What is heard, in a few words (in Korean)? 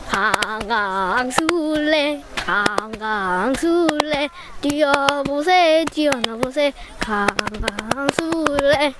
강강술래; beach